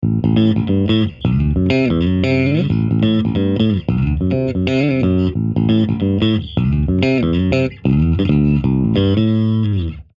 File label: Fingers Ibanez SR1205